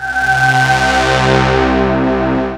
PPG PAD; OLD SYNTHS; Rubber Chicken Software Sample Bank

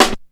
Snare; 90 s Sample CDs; Total Drum&Bass

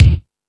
Lotsa Kicks, Drum Machines

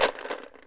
ELMBARK, PERC; AKAI S6000 CD-ROM - WorldSounds

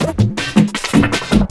Drum & Bass, 160 BPM

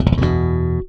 Slap One Shot EB, Bass; Bass X

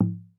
HOPI DRUM, American Natives; E-MU Formula 4000 Series Vol. 4; Earth Tones

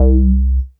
BSE C, BASSES, House

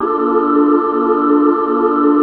PAD, Drum & Bass, 160 BPM; Ueberschall; Drum & Bass Resonance

